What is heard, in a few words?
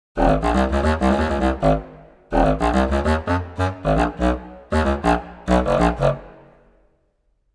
contrabass clarinet; sampled contrabass clarinet; sampled woodwind instruments; Berlin Woodwinds; Orchestral Tools